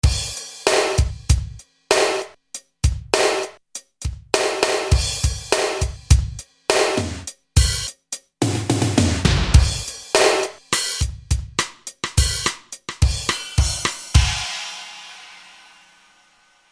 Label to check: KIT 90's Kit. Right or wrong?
Right